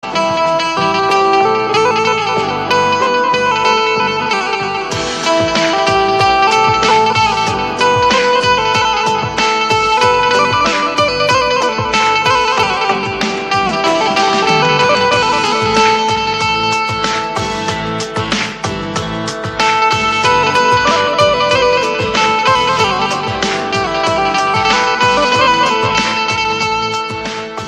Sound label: instrumental ringtone